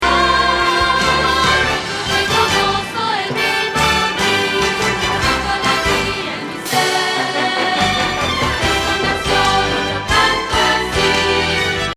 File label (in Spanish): Contralto